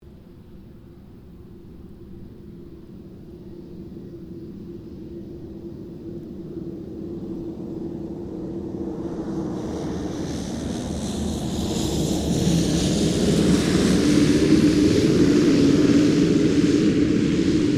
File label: 2007 KING AIR 350 LANDING; Airplanes; Vehicles